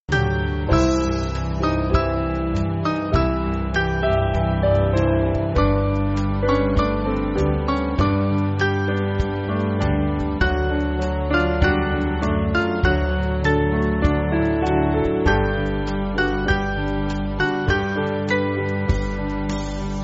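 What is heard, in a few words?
Band, Backing Files for Churches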